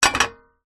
household saucepan put down on portable camping stove